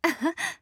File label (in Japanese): あはっ